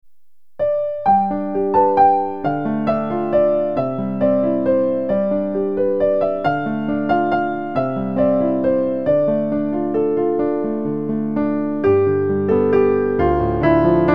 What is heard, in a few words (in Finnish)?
Pianoballadi